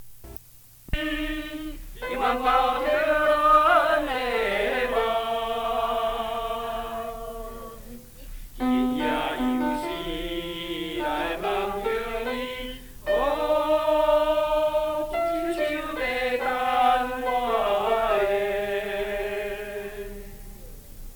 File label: Homeland Calling Me, Tenor; F-Chorus